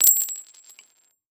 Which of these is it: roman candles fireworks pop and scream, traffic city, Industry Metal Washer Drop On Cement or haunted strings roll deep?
Industry Metal Washer Drop On Cement